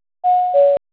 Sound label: Ding Dong; Telsa Recorder Server; ivr